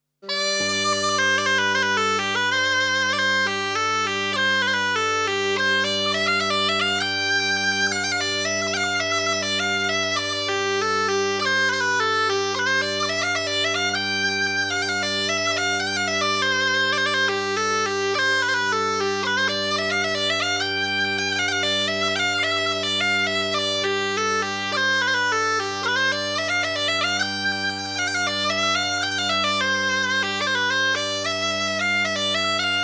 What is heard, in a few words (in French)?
valse; La valse à Chabrier